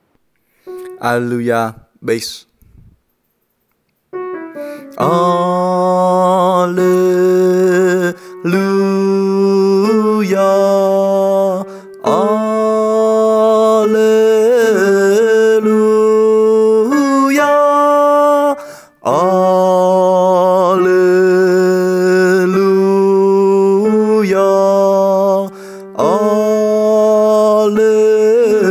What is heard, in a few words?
Alleluia Bass